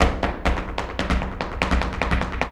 Flamenco, ZAPATEADOSOL

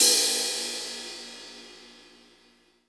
brush; Northstar - New Gold VOL-2; 50S BRUSH

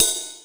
RIDE, drums; Big Fish Audio; Synth City

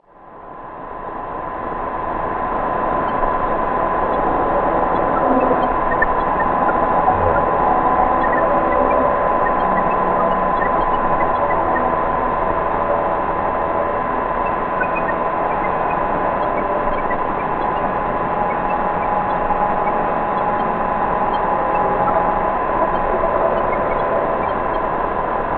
LOW SHORTWAVE MELT; DEEP ATMOSPHERICS; Sonic Foundry (Sony Creative Software); Vir Unis Infusion

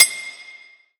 PLATE, Drum Hits, METAL; PERCUSS.ION

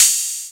OPEN, Techno Trance, Perc; E-MU Formula 4000 Series Vol. 2; OrbitPercMaster

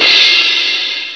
RIDE CYM; M8; CMI Factory

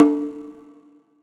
Echo Bongo; Sonic Foundry (Sony Creative Software); Joe Vitale Latin Percussion

